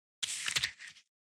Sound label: FLIP A page